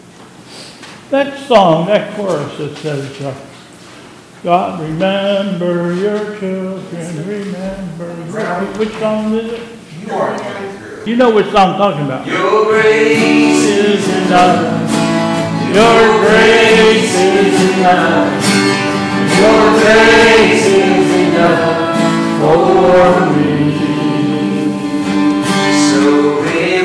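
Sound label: Opening Chorus, church service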